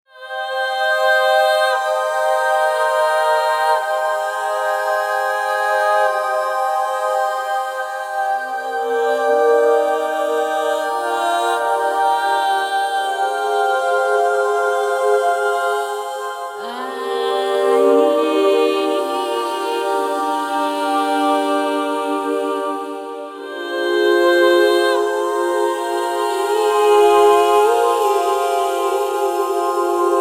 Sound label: vocalise performance palette